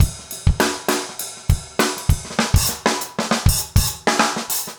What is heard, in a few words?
basic funk 100r